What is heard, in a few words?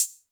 OH Combo 808 Tape, Open HH; Clean